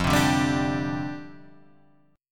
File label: F 6th Suspended 2nd F